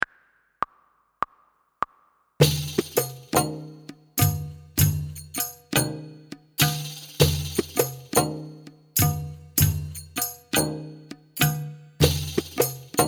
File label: Ga slide practice without tabla